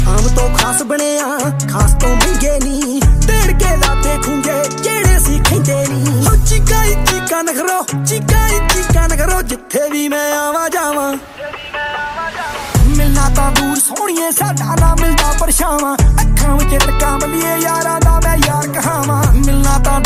Punjabi Ringtones

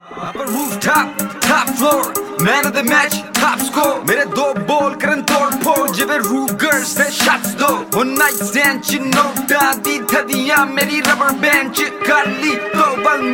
Rap Punjabi Ringtone

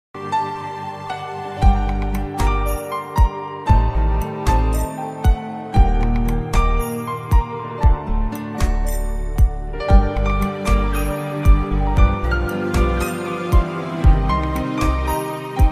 Pakistani Drama Flute Ringtone; IbneHawwa

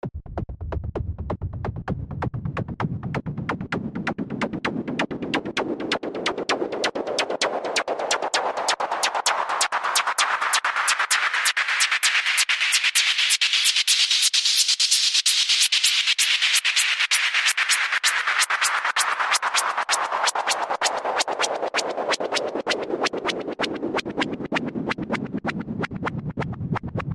Funny Ringtone for iPhone

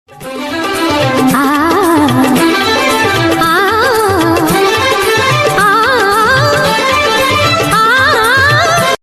Hindi Remix Ringtone

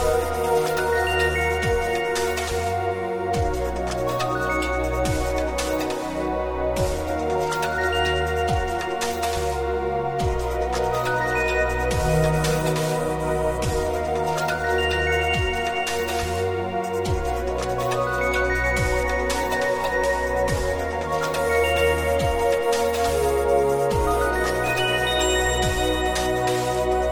Electronic Ringtone

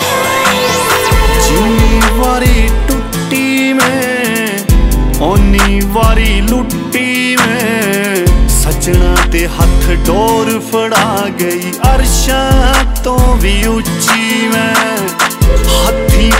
Sad Punjabi Best Ringtone

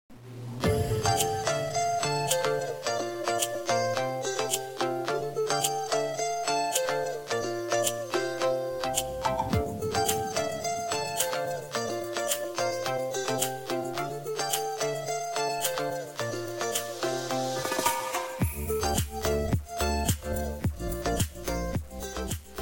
Huawei Mate 40 Pro Ringtone

